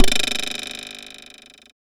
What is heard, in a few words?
XTRA PERC